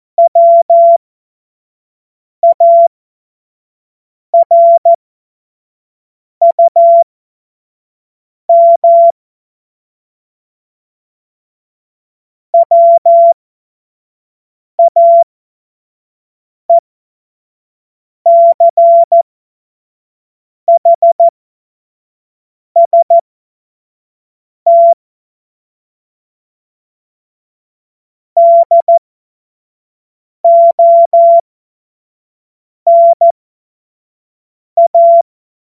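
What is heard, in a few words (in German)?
Witz, cw morsen; Morsekurs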